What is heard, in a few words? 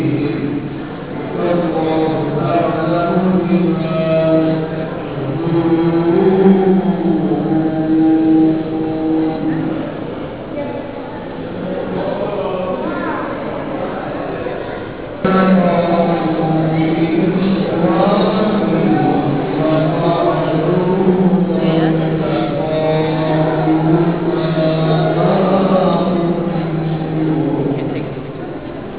mosque at dusk; Istanbul; BlueMosque